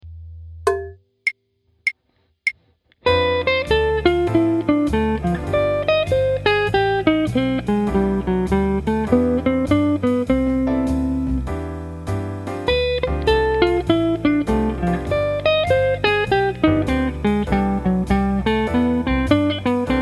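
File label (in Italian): Jazz Guitar modi, Scala maggiore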